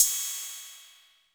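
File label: 606 cymbal; Crypton - DrumBase5000; TR-606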